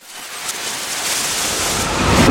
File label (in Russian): shumnij svist